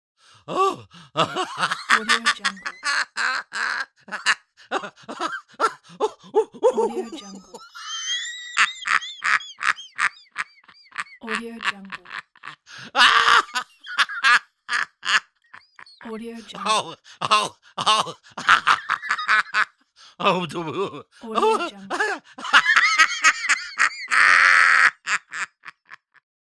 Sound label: hilarious laugh